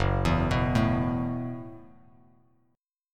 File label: Gb Minor 11th Gb, Bass Standard, chord